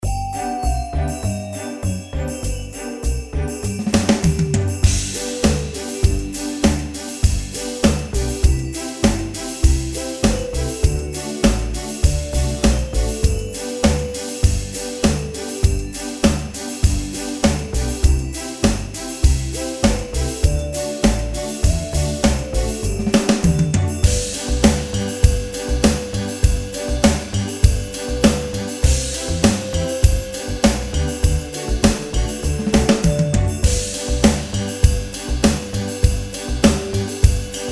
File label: jingle bells 100 bpm